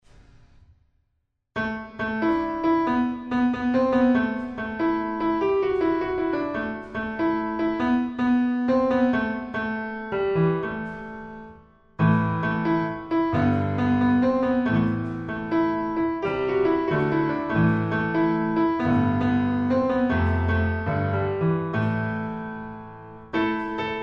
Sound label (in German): Irisches Stueck